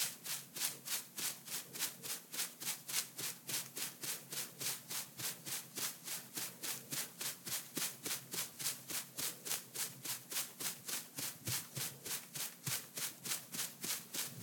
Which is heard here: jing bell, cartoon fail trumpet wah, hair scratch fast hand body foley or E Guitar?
hair scratch fast hand body foley